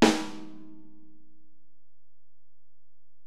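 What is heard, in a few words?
Acoustic Snare, Alesis DM-PRO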